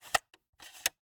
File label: Viewmaster, plastic, lever, load release; Eiravaein Works; Vaeyan IV